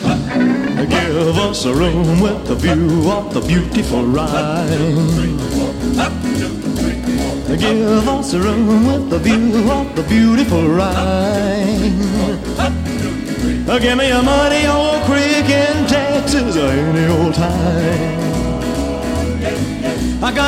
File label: blues